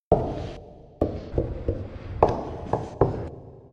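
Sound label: creepy footsteps with reverb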